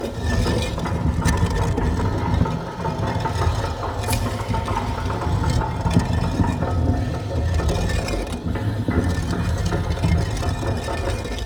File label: Hub Boulder Loop; Warframe; Infested Eris